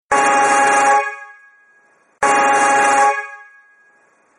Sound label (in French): 3 trompes acier superposées petites en 24v